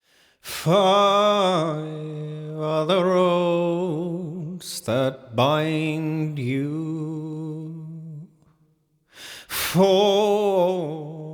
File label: Tempo 160.0 eng vocal; assassin s creed valhalla